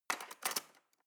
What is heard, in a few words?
cutlery teaspoon drawer scrape